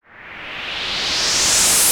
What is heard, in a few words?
HOUSE FX 125